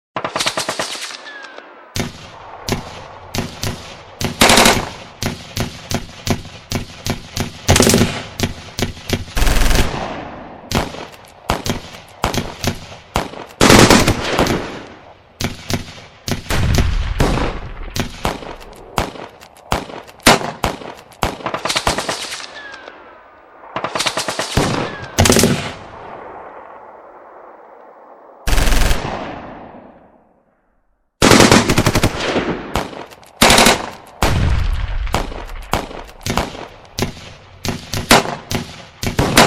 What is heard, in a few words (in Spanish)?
Sonido disparos tiroteo balacera con bombas Audio de guerra; SFX; RealSound; para película